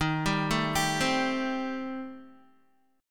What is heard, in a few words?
Eb 6th Flat 5th, Guitar; Eb6b5 arpeggiated; JGuitar